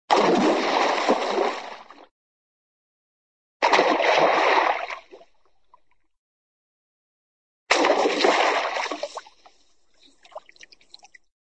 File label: water, splash jump in water splash, cartoon